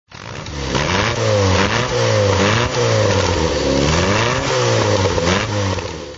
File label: triumph herald